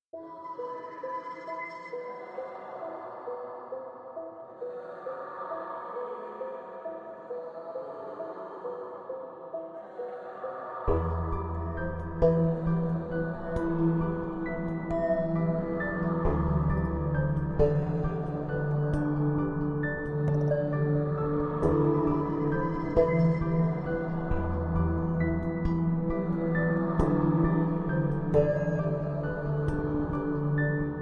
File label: No Arpeggio Synth No Bells and No Theme